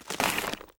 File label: root Defender retract; heroes nyru